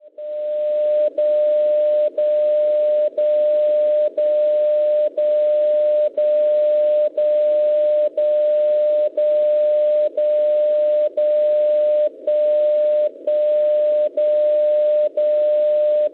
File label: MSF TS Anthorn Gran Bretagna 60 kHz; Schwarzsee